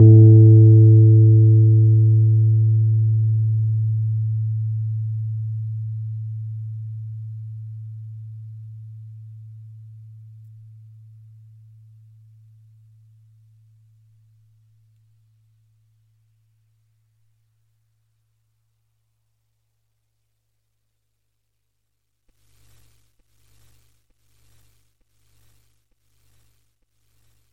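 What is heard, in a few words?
sound of note A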